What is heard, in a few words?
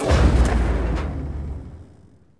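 construction site crane end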